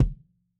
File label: S KICK HIGH KICK, drum; Sonimus; s series drum pack; STONEQ 4K; SATSON; SATSON CS